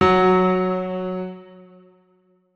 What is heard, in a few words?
notes, keyboard piano; Hideout Furniture